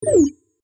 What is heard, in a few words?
Bar chart fade out animation infographics